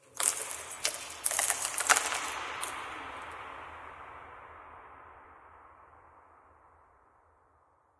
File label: amb rock debris, ambient organic; video games; source engine; portal2